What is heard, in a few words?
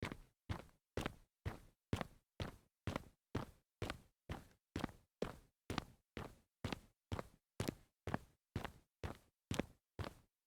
walk fast on concrete series, foley